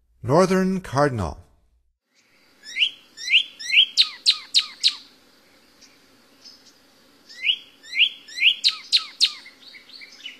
Northern Cardinal, Songs of Birds of North America; Stokes